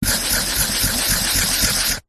office marker pen on cardboard fast scribbling circles